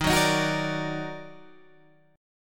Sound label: Eb Minor Major 7th Eb down Guitar Open G, chord